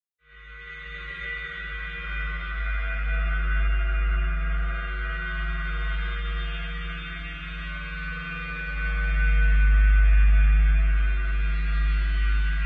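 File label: sounds of a mirror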